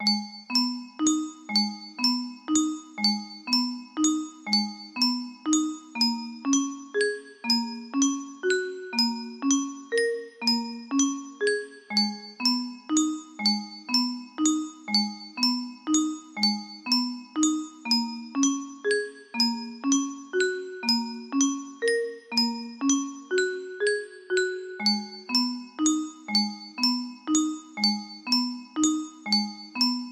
piano piece; scorish piano piece

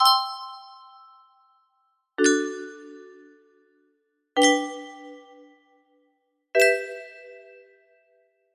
beats c g d em, music box melody

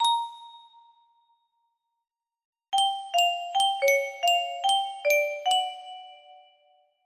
Untitled, music box melody

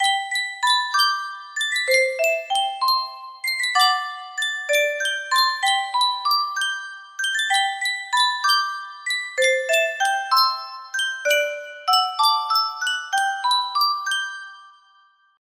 music box; yunsheng custom tune

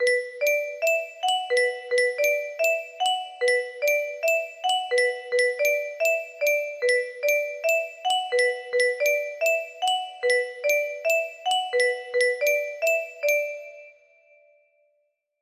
music box melody

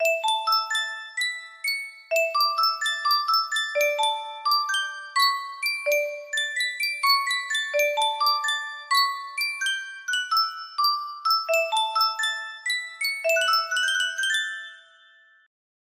music box, music box melody; maplestory

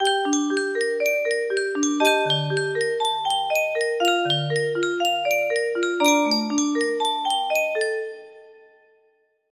ending, music box melody

